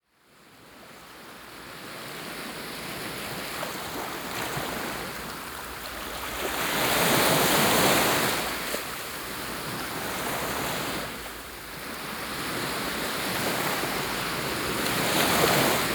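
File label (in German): Meeresrauschen Aufnahmen kristallklar Naturgeräusche Meeresrauschen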